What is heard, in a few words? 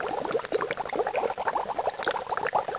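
bubble loop, lava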